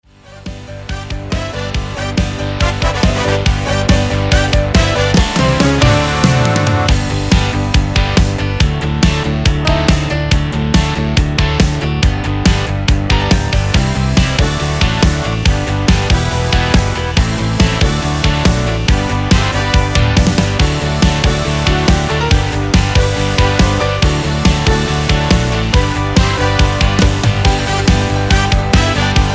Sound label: Midi Yamaha; yamaha tyros 5